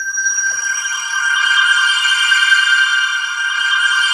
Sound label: SPACE BELLS, SYNTHESIZER STACK; AKAI S6000 CD-ROM - Volume 1